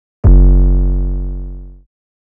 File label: bass basic 808